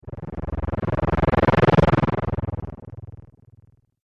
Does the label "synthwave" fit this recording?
No